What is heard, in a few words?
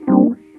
Rhodes FX; Mo' Phatt; Digital Sound Factory Mo' Phatt